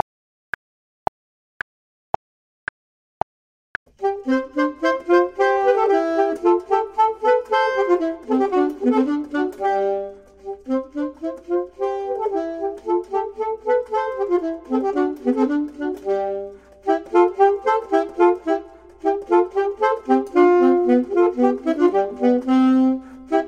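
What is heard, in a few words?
both, Saxophone and Clarinet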